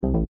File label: error